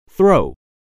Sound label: throw